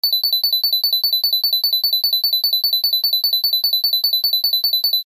Sci Fi Alarm Beeps Digital Technology Readout Loop; Control Panel